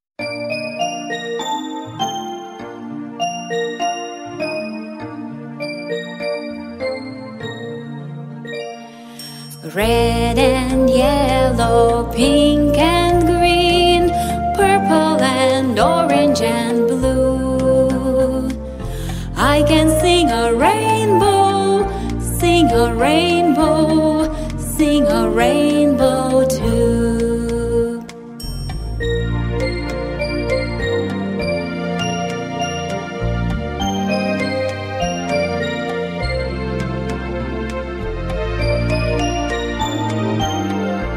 English Nursery Rhymes Children Songs The Rainbow Song